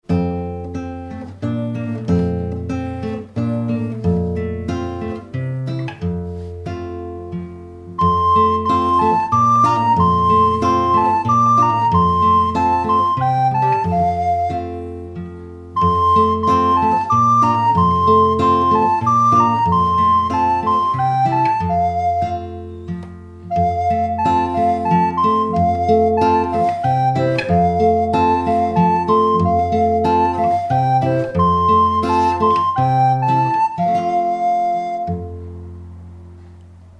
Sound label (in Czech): nesem vam noviny doprovod a fletna; koledy